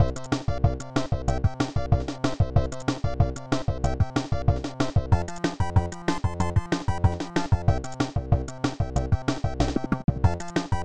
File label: heatbeat; greeblies